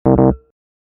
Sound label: failure To Drop In Atom, sonification; build-an-atom